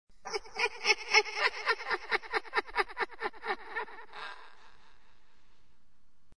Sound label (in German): gelaecht